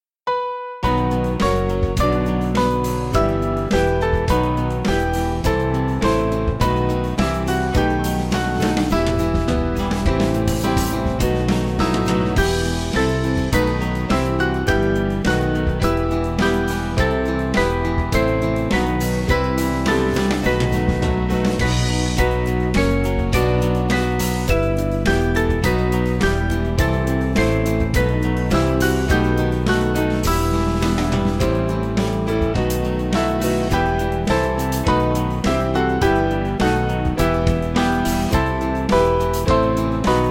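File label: Music Backing Files for Churches; Let Us Bow Down; Bryn-Teg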